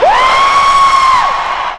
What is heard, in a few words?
vox special; Ueberschall; Techno Trance Essentials